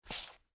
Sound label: Female Boots Scrape, Footsteps, Female, Boots, Scrape, Hard, Wood, Surface